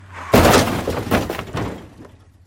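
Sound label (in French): Accident, voitures; Accidents voitrues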